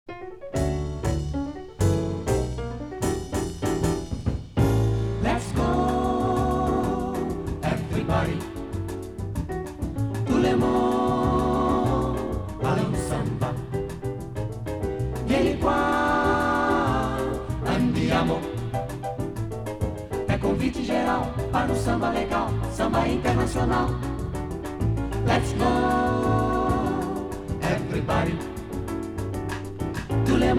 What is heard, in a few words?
Samba Internacional